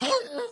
wolf grunt

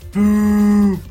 boo

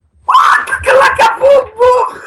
aaaahh

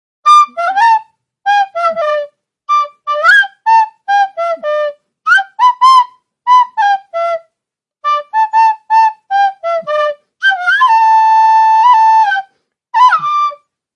fail recorder theme song

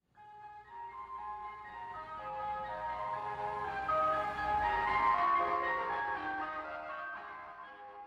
ice cream van sound effect

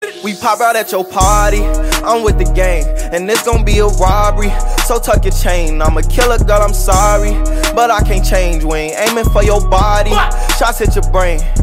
live performance open mic

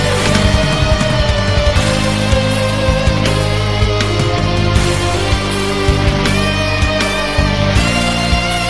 gears guitar

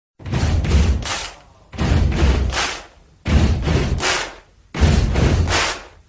freethrow

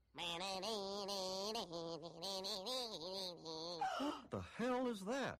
music

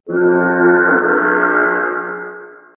beach efx

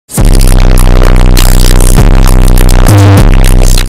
ear rape sound effect

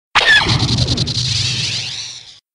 fire blaster

